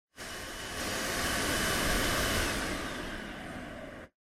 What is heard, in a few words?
hand dryer